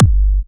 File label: Kick